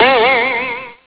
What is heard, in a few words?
beep spring